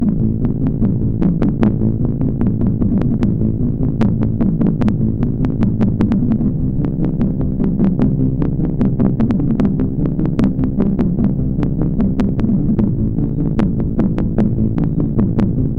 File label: Machine, MOS6581